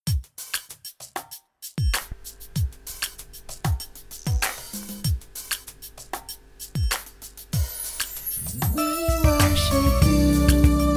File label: SOPRANO